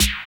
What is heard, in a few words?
DRM OLD, Dance Synth; Sound & Vision - Gigapack I CD 2